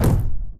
Footstep Jog Mid; Titanfall 2

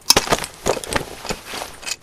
conductor foley; commander; baker; Titanfall 2

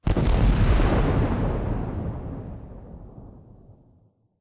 weapon fire explo; Titanfall 2

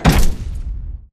footstep generic close; Titanfall 2

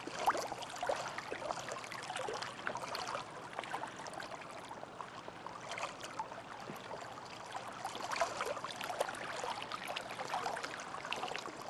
amb dock water; angel city; Titanfall 2

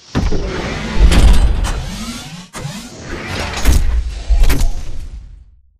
titan move out; Titanfall 2

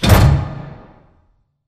pod door sm verb close start; Titanfall 2

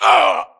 grunt death quick; Titanfall 2

